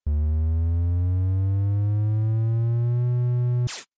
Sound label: fuel engines canister task complete sound effect; among us